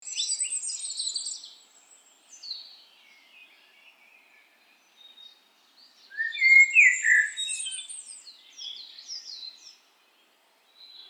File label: cheerful chirping of birds nature sound